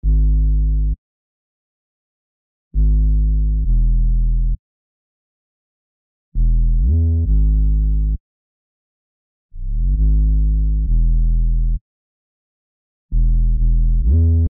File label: Melody Loop Gmin 133 BPM 808